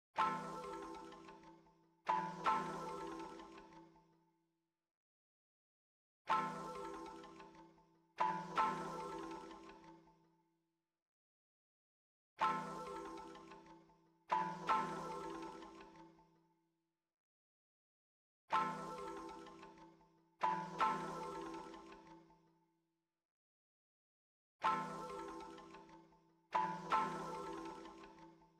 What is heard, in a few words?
157 BPM A Min Pluck, Loop Stems; Melodies; Melody Mystery Expansion; Frost